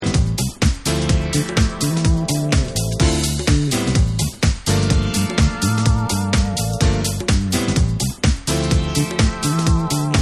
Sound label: Instrumental